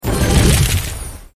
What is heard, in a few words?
special double Radius Activate; sweet shuffle